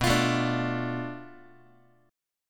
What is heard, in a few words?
B Minor 7th Flat 5th B Guitar; Bm7b5; strummed; x,7,8,7,6,x; JGuitar